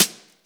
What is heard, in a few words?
sfx perc